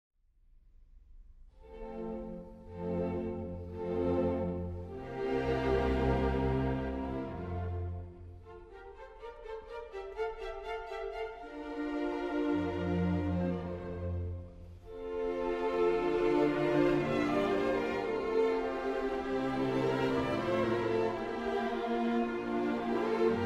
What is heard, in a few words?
Andantino from Concerto for Flute & Harp; Mozart